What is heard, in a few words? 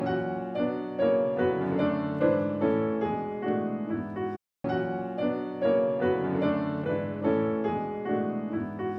Correcting wrong notes before and after fix